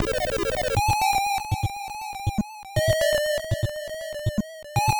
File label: elevator; die-hard; task-acknowledge